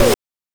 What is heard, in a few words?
BANK2 SFX, NES DPCM; MIDINES